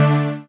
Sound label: Dm, Chords; Scholar; M8; Electronisounds